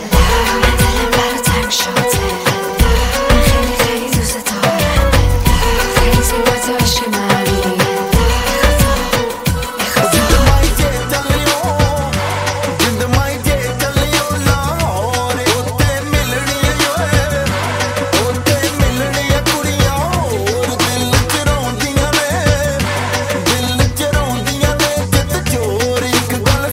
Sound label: music hindi ringtone, bollywood